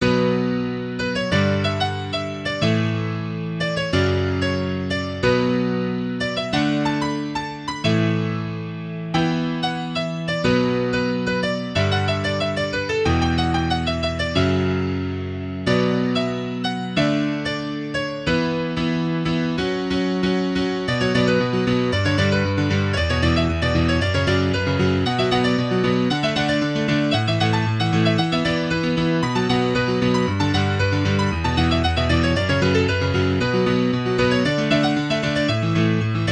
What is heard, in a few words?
Forest Theme; SuperTux 0.6.3